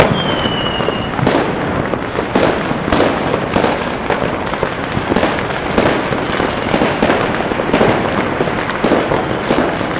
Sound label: fireworks; shellshock festival; 2007